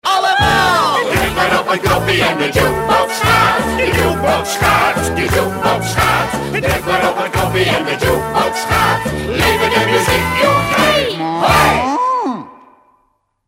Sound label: Jingle; Jukebox; radio veronica